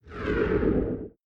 bomb thrown

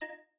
energy

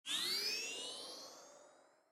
pow speed

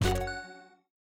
purchase

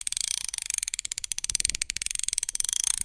cranking

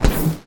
pow dash

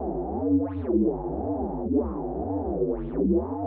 drunk loop

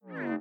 thin

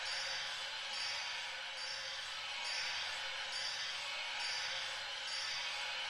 curve blind loop

